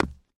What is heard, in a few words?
concrete step; Original TK Sounds